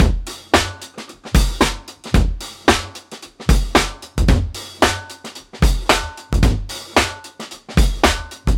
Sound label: 112 bpm drum loop f sharp key